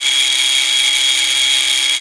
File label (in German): emotor